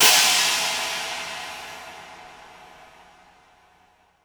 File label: IMP CYMBAL, Cinematic Impact